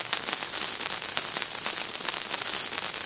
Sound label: fuse burn; XDM; ambience